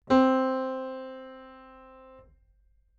打弦, 弦鳴楽器

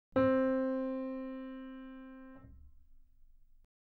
打弦, 弦鳴楽器

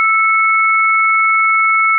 正弦波（定常）2本, 仮想ピッチ; 音の高さ

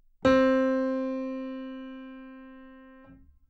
Pf, 打弦; C4; 弦鳴楽器

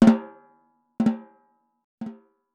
スネアの接触／非接; 膜鳴楽器; 打奏; スネアの接触／非接触

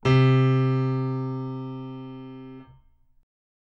ピアノ時間逆転音 C3; 減衰音

